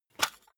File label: cassette case open hard; objekte; kassette